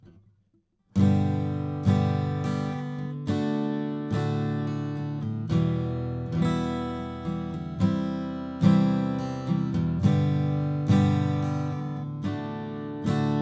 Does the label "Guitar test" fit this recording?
Yes